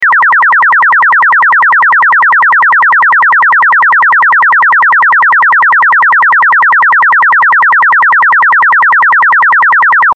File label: chirp; chirp toys